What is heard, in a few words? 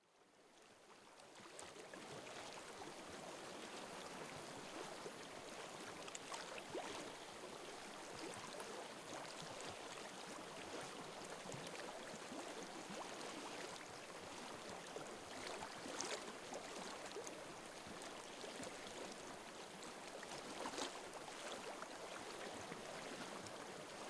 river